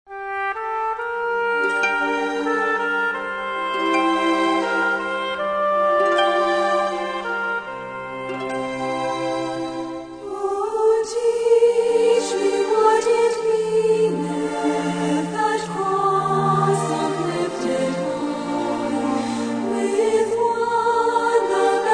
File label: Choir And Orchestra